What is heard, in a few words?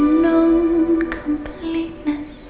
female laugh